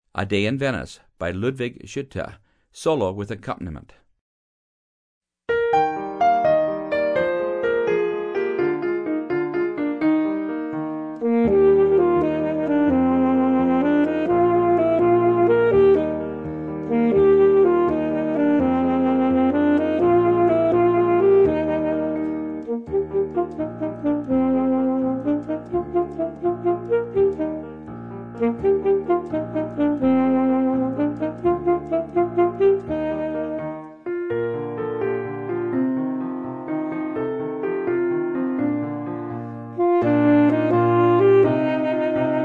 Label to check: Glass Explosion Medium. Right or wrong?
Wrong